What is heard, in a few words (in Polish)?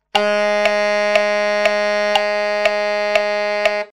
dziura f1, saksofon